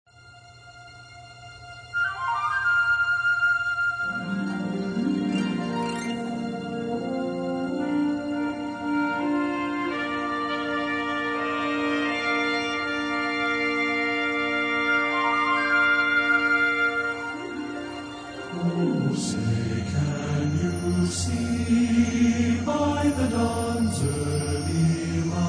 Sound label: The Star-Spangled Banner, men's chorus